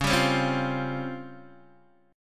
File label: C sharp Major 7th Flat 5th Guitar DADGAD